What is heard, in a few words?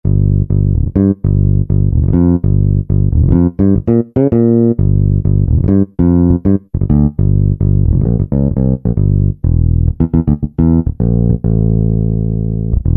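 bass Finger, Yamaha TG500; Preset Voice; BA Fingr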